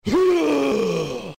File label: huuuugh